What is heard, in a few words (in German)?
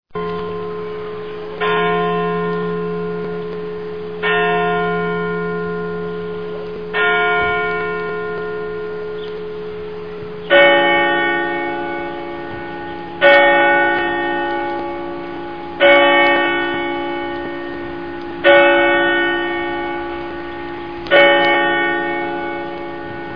glocken